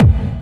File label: hard bass drumz